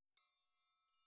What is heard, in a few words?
TRIANGLE CLOSE